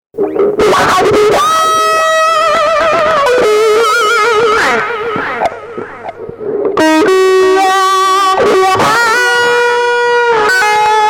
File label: delay